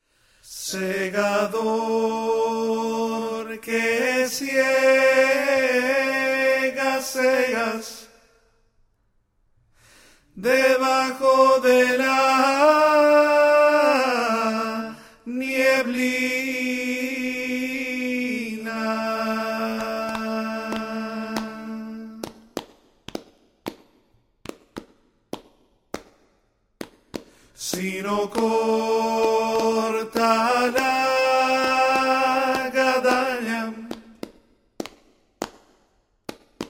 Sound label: tenor